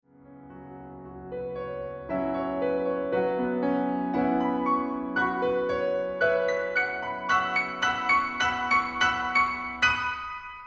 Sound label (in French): piano solo; Cycle de Haikus Premier livre